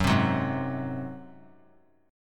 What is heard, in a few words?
F sharp Suspended 2nd Flat 5th F sharp, Chord; strummed; JGuitar